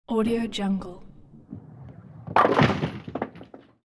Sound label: bowling ball throw; hollywoodedge